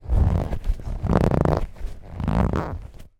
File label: sport afl ball handling close up; zapsplat